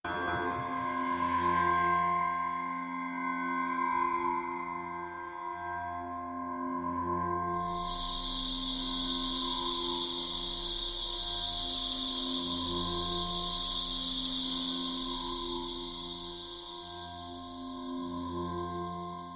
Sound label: Drone, Light